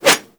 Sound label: Manic Claw Swipe; Warframe; Grineer; Attack